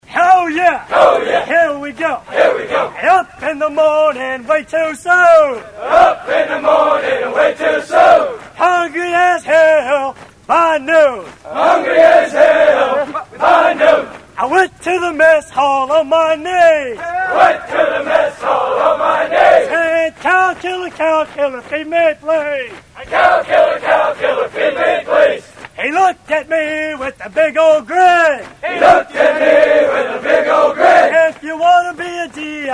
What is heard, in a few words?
USMC running cadence